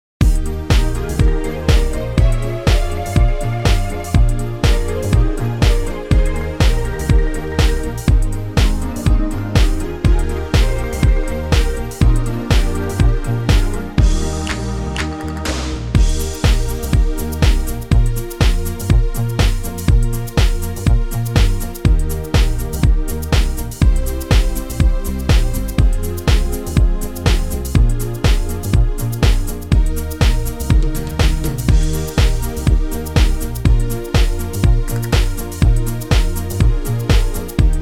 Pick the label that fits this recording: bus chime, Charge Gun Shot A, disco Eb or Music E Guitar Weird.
disco Eb